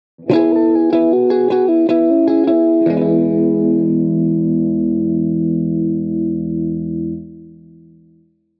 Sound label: Neck and Middle pickup girly pop, guitar